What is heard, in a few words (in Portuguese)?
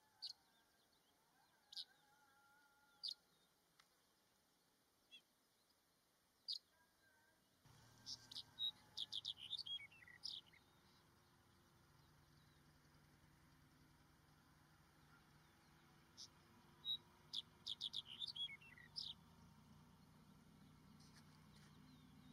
Canto da escrevedeira de cabeca preta